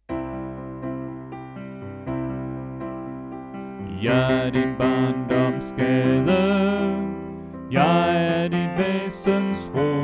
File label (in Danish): Bas; oevefiler